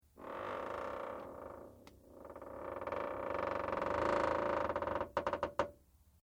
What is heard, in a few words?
METAL CREAK LIGHT; Materials